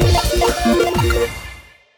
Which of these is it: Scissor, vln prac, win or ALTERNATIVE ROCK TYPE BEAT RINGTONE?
win